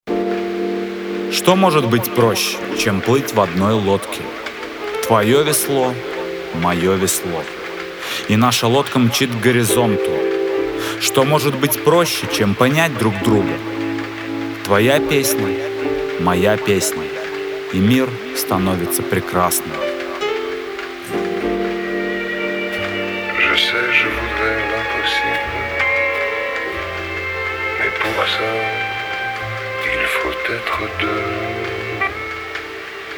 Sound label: rap